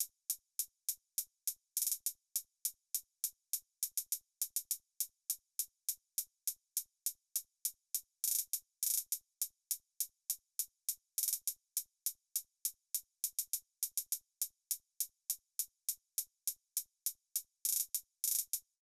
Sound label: Hihat Closed Beats 102 bpm; Loops; Drums; Top; Ableton Live 12 Lite; Core Library